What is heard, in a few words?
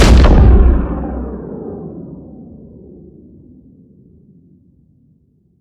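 Sci weapon Shot Large Gun Titan Cannon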